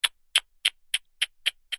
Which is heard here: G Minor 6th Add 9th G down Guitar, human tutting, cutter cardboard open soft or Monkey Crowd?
human tutting